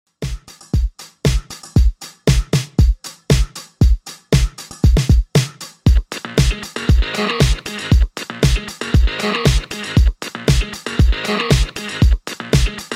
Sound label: Redrum 117Bpm